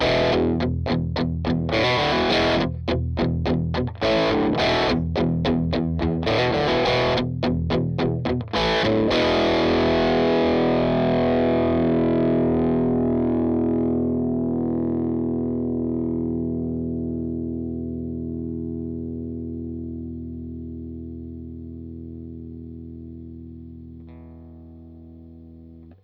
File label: Distors G50 Digital, Distors G30 Cable Tone Off; riff cu distors; G30 poate simula cablu de 5 si de 9 m; Line 6 Relay